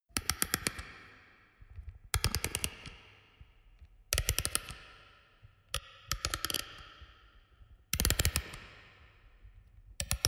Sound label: harsh noise music box